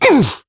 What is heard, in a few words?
Pain, Adult Operator; New War